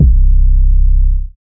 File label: 808 Vroom; 808 volume 1; SOUNDEALER